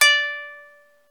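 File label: ethnic plucks; Eth Plucks, Pluck Duo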